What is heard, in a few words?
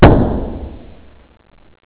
pop; flyhard